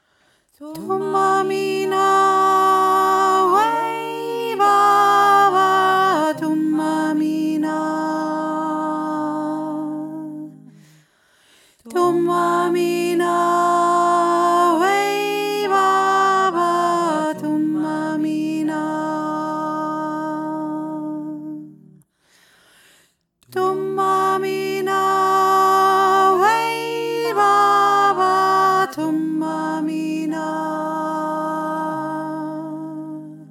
thuma mina hohe stimme

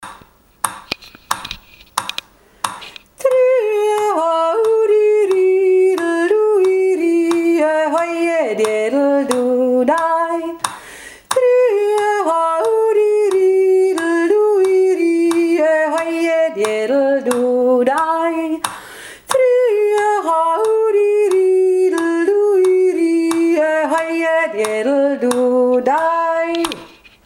verjodelt

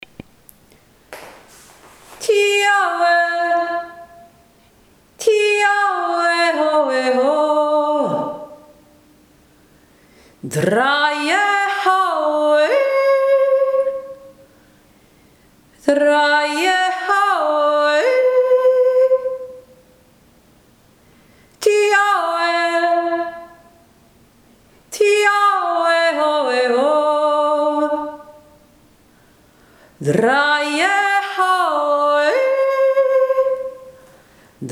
jodler

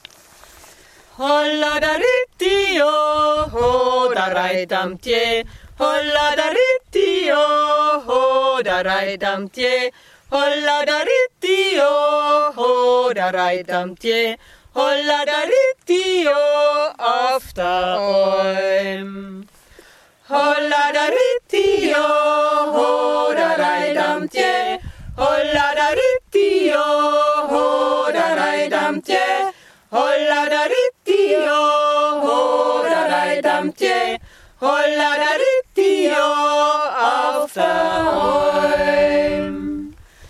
echojodler; admonter

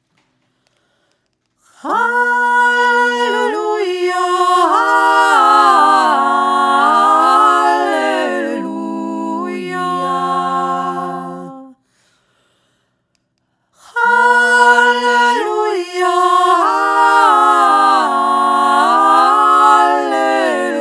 halleluja

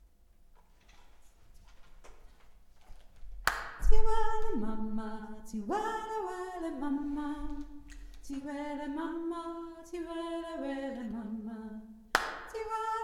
tiefe mittelstimme ziwele mama

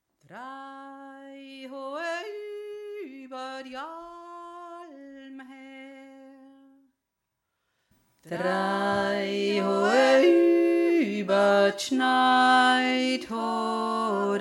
untere Stimme, Jodler; Der Jodler

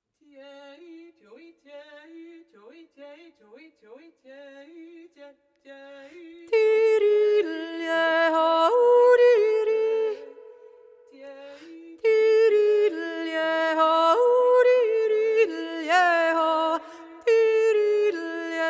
pliss e h here stimme, jodler; Der Plissée Jodler; Die zweite Stimme

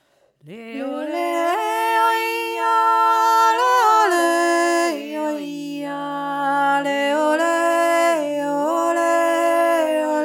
sonnenyok obere stimme

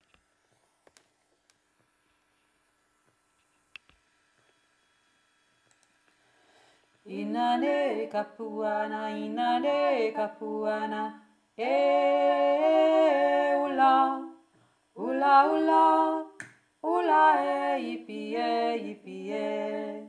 kapuana tiefe stimme

